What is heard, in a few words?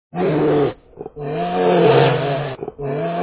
bear sound